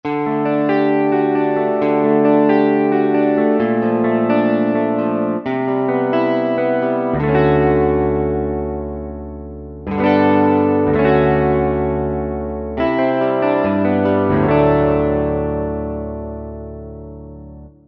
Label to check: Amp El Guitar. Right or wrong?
Right